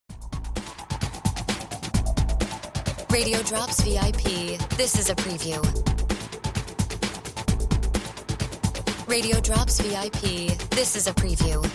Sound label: No FX Music Bed